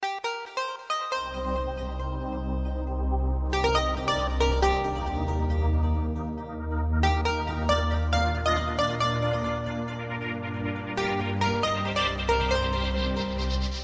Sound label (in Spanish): El Ritmo